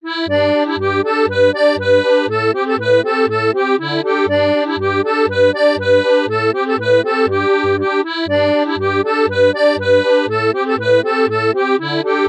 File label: Blackwater Polka